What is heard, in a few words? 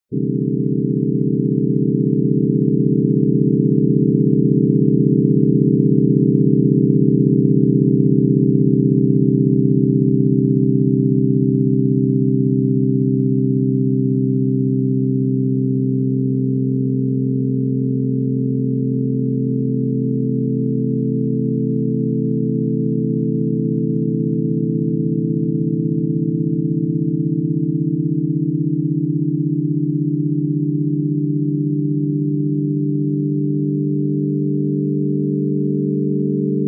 pentatonic 5 limit offset; grainger